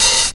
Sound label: short open high hat c key, Royality free open hat tuned to the C note. Loudest frequency: 5422Hz